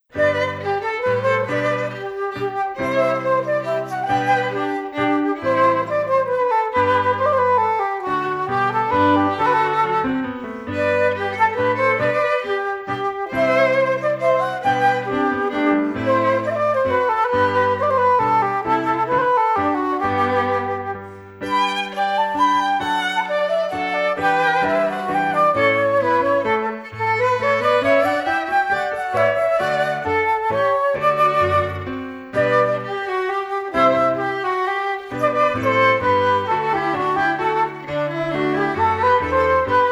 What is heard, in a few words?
Minuet in G